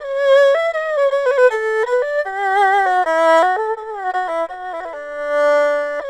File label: ERHU, 80; INIS - Opium